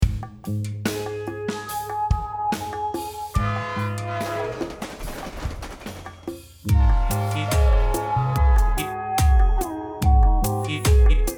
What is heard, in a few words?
slo funk train